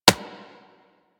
Bullet Impact Generic; Surface

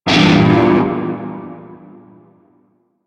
Shawzin Phrygian Chord C; Music; Warframe; Narmer Shawzin

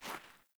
Grunt Boot Walk Gravel, Footsteps; Characters; Enemy; Melee Grunt; Boots; Sinecure

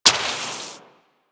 Water Sprayer Mech; Warframe

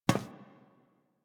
NPC Step Default, Footsteps; Warframe; Friendly NPC

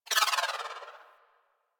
Melee Charge Turbo Dash Activate; Warframe

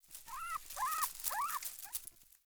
Hare Idle Chatter; Encounters; Animal Rescue

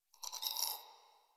Tree Critters Angry; Warframe; Duviri

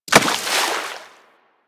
Melee Impact Water; Warframe; Weapons

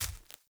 Walk Veg, Footsteps; Enemy; Mockeries; Mockery

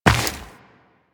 Run Dirt, Footsteps; Warframe; Tenno

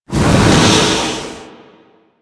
Tear Spawn; Warframe; Entrati; Swarm

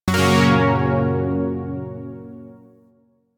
Shawzin Hirajoshi Chord; Zariman Shawzin

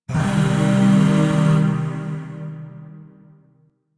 Shawzin Pent Min Chord D; Music; Chords; Warframe; Zariman Void Shawzin; DE

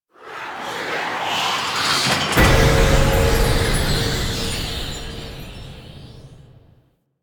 Treasure Room Unlock; Ambience; Orokin Moon; Warframe

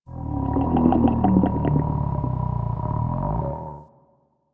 Landscape Mushroom Creak; One Offs; Warframe; Venus Open World

